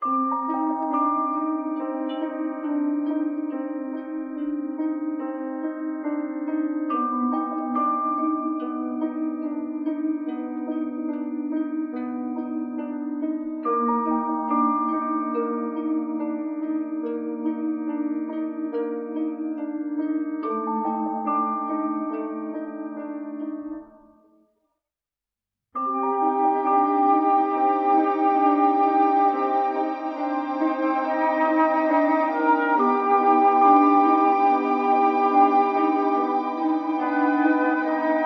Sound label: vibes and strings, Sad